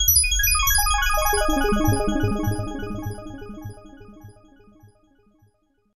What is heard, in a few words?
telephone